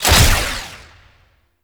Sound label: Mech Omni Tool Start; Warframe; Nechro Mech; Abilities